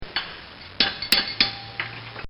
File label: Blacksmith Shaping Horseshoe; Animals